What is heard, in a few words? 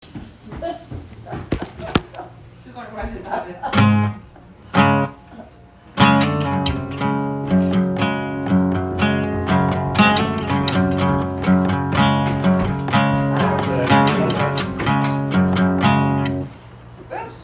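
cool guitar hook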